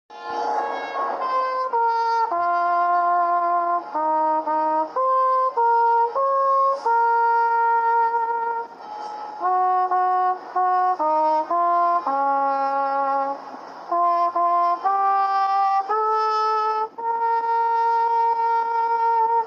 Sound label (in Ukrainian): melodiya z cherniveczkoyi ratushi